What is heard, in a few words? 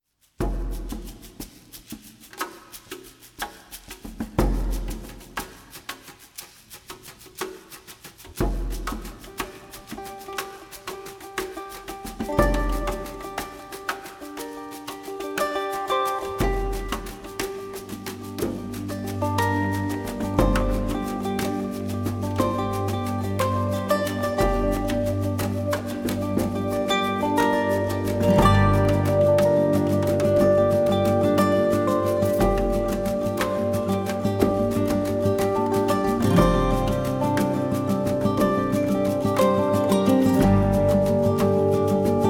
Charango Volcano